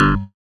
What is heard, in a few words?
SYN ROXY, Dance Synth; Sound & Vision - Gigapack I CD 2 (Roland)